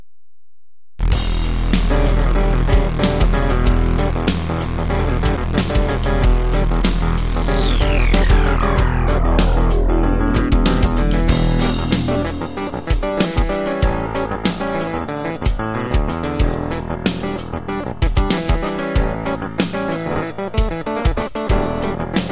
Fat Bass Clavinet; pianoteq7; hohner

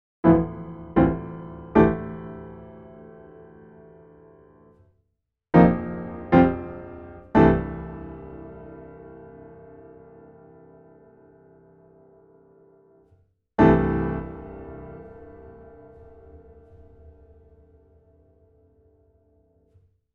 pedal catch

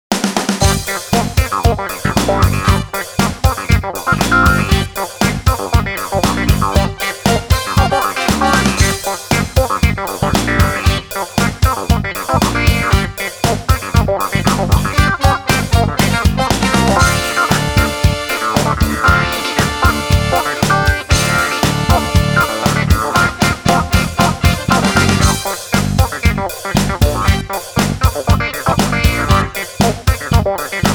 Mister Funk, hohner; pianoteq8

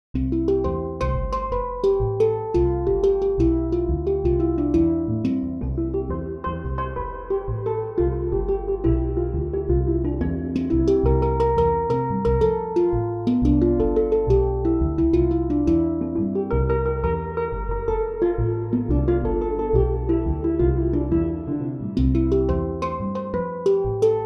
handpan; steelpans; pianoteq7

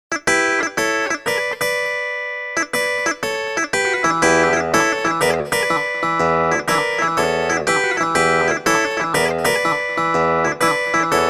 Sixties Clav; pianoteq8; hohner

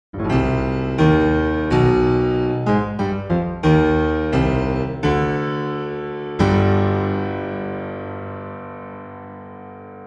buzzing

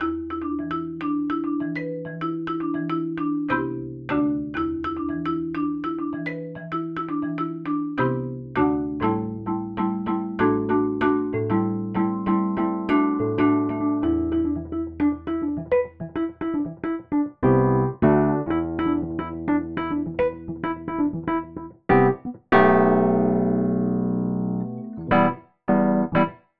morphing, pianoteq

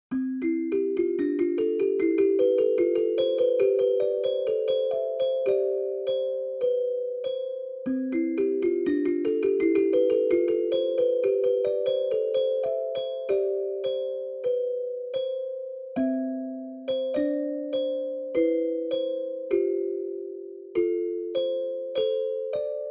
Kalimba dance, celeste; pianoteq8

